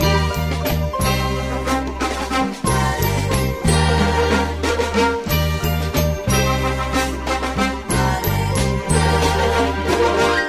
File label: keys